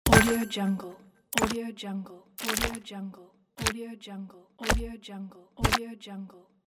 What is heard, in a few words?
stone menu pack